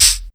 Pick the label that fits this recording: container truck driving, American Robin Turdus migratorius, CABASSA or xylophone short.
CABASSA